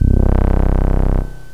Synth Brass; GMPlay; Synth Brass2

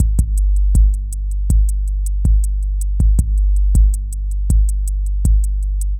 DRUM LOOP ambient 160

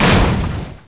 metal bang; Empire Earth

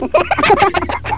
laugh; The Epic Collection 3; Epic Marketing; muzak samples

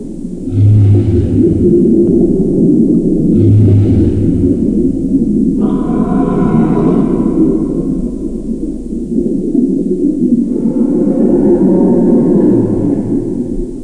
Underwater; Coral Reef 95 Screensaver

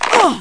FALL; QUAKE2; female

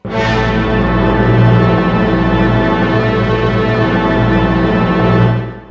brasso

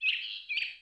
birds

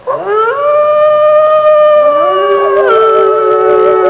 wolf howling; Sound Effects for Multimedia - Volume 1; forest animals

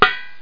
ting

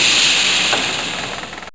button over

